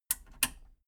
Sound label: metal button radio click power off slow